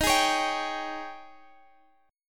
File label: G sharp Major 7th Suspended 4th, D sharp